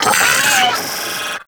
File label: Arachnoid Vocal Leap; Warframe; Corpus; Arachnoid Hunger